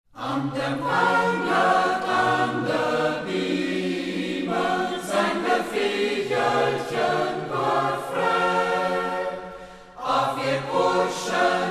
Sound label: chor, Reußmarkt; Ortsmundart: Reußmarkt; Angderm Wängert (Det Wängertliedchen); Reußmarkter Chor; Grete Lienert-Zultner (Melodie); Hegt wird gesangen